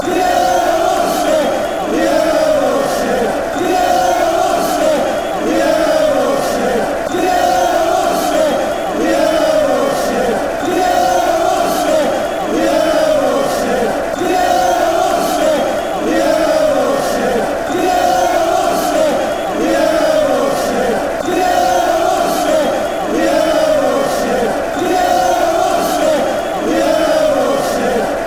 you are sit fanchants; hufc

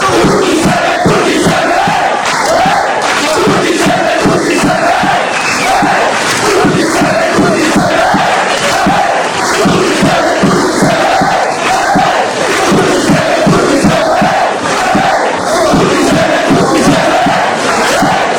all together, fan chants

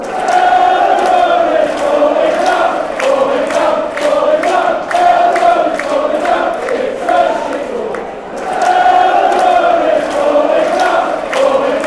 fan chants; elland road falling down

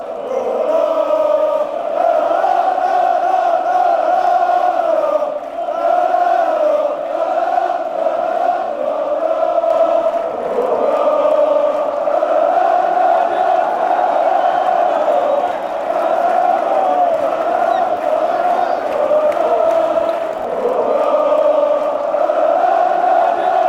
lololo lololo

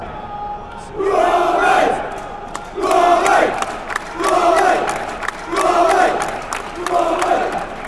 blue army macclesfield fc, fan chants

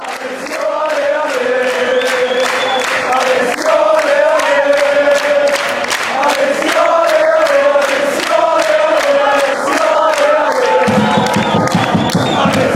allez sion allez allez, chants

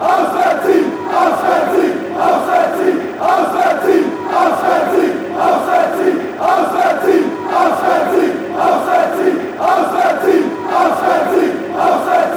away win, chants

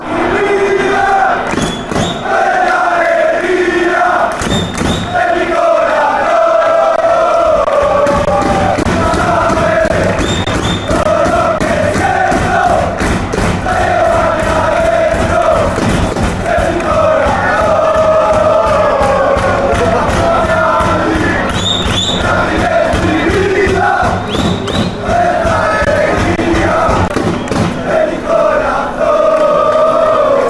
heart fanchants

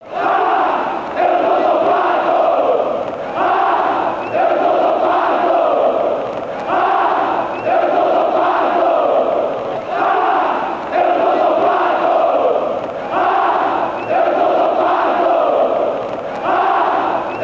ah eu to dopado, fan chants

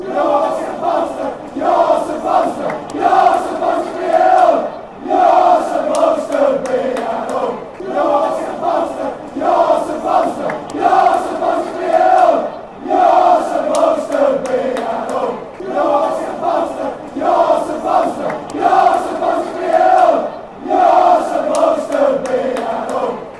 youre supposed be home; hufc

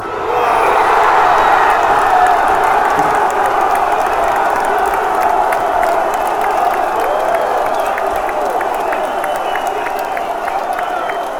goaaal, fan chants

